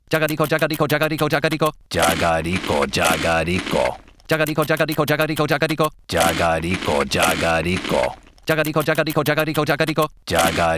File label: rhythm A